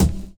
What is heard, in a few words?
BD; Best Service; Dance Mega Drums